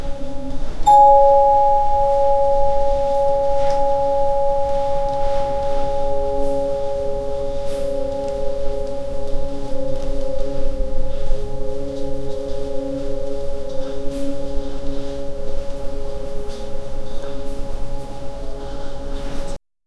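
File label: soft twinkling fairy lights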